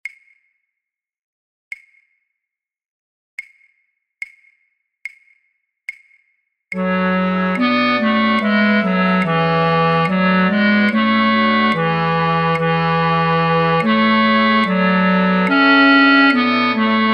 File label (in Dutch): Basfluit 1A, Basfluit 1, fluitorkest; voor oefentracks; Matthaus Passion